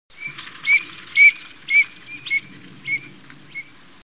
chirok svistunok, birds

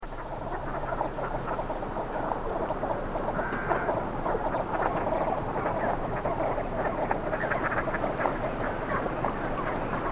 shirokonoska, birds

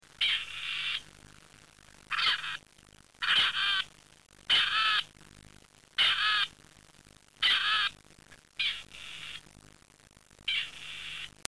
seraya kuropatka, birds

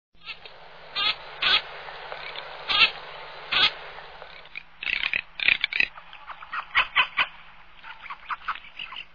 chirok treskunok, birds

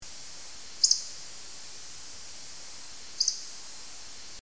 valdshnep, birds

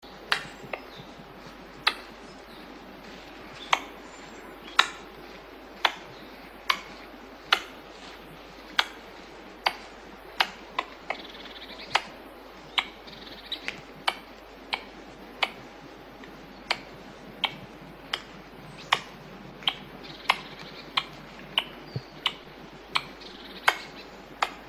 belka, animals

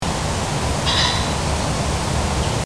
fazan, birds

